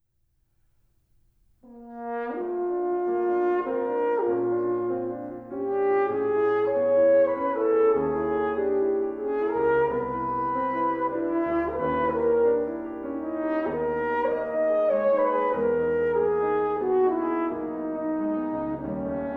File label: Sonata for Horn and Piano Quasi Menuetto